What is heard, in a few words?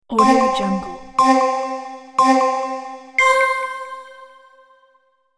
game play countdown